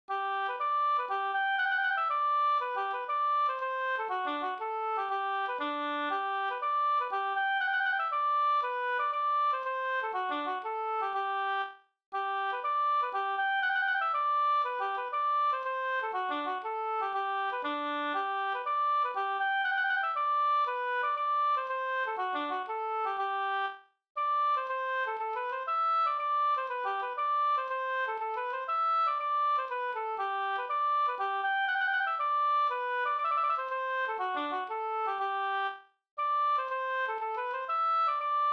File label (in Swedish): hamburska